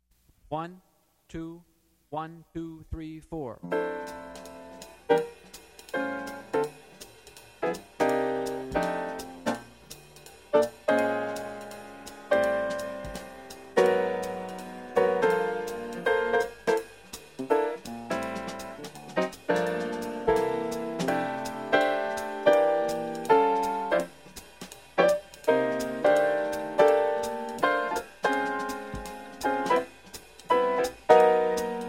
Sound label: bird blues no bass